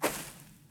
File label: Footstep